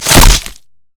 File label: trollsmash oh; trundle; LoL